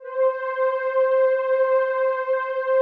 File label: PAD, DIGI-PAD